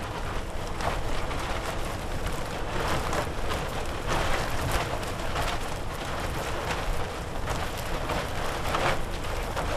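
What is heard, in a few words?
tarp movement plastic close; Titanfall 2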